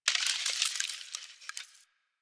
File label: Landscape Ice Slide Small, Ambience; Warframe; Venus Open World; One Offs